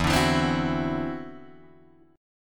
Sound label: E 7th Suspended 2nd Sharp 5th, E, down, Guitar, Drop D